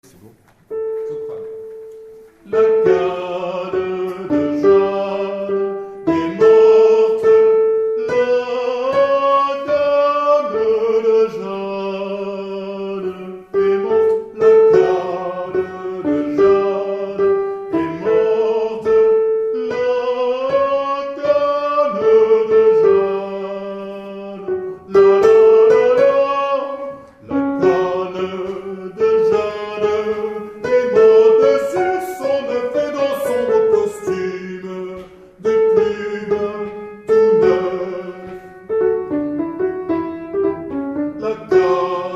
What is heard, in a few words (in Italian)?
Soprani